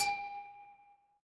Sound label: glass clink